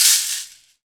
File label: shakers